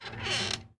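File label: Wood Creak Small; Prison Architect; Room Store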